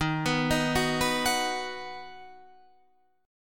D sharp Minor Sharp 5th D sharp down

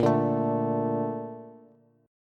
Bb Major 7th, Bb, down, Bass; BbM7 strummed; JGuitar

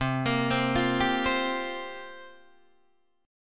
C 7th Suspended 4th, Guitar, DADGAD

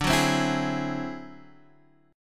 D 9th Flat 5th D down Guitar Open G

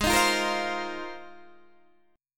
Ab 6th Add 9th Ab, Guitar, Open D

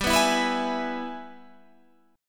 G sharp Minor Major 7th Sharp 5th

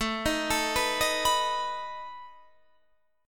A Suspended 2nd Flat 5th A, guitar

